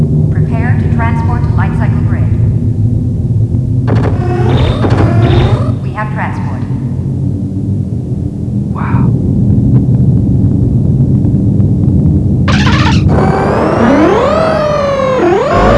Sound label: lightcycle; classictv; tron